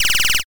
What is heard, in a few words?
rewind